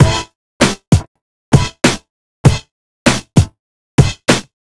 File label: Urban Beat, Drums